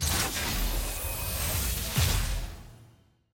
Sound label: loot open masterwork chest outro; lol